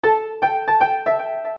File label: sound logo